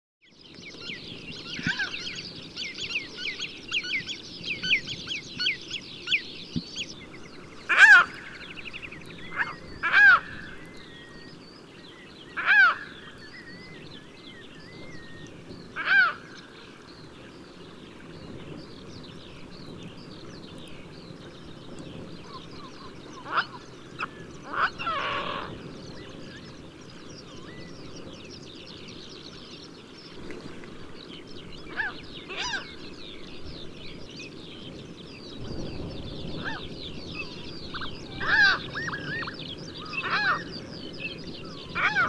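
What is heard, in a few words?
relict gull voice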